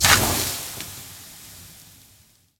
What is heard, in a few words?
lava hiss; Scrat